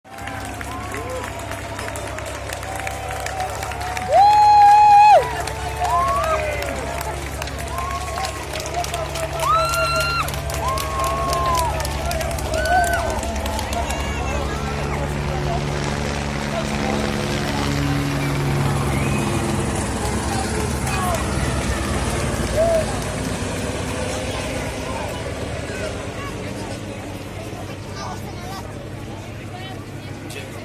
Cycling race

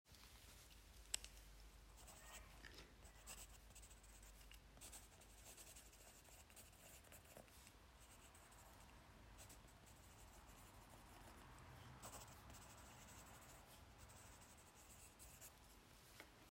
Writing on paper

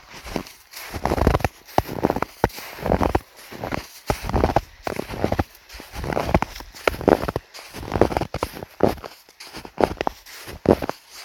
Walking in the snow

